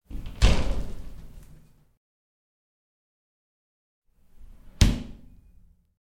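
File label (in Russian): дверка душевой захлопывается и открывается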